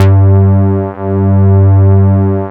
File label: PROPH BS G3; Drums In Your FACE!